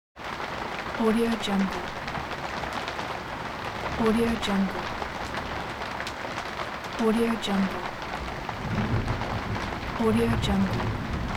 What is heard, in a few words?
medium hard rain on wood cabin roof; hollywoodedge